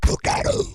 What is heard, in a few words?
hurt; minecraft